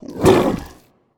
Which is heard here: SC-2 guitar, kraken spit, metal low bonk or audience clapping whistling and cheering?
kraken spit